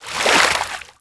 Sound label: swim water surface